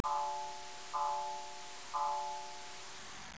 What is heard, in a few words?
chime hi; autodesk animator